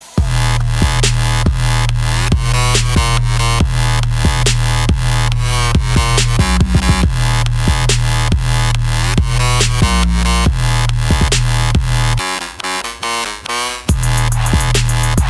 festival trap remix

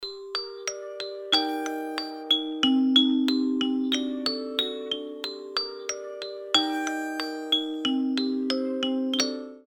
lullaby

